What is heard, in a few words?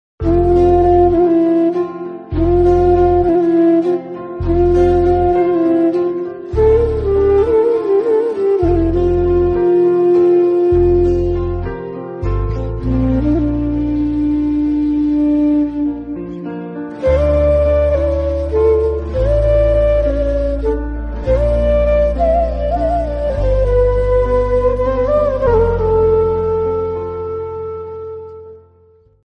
Song Flute; Mayanadhi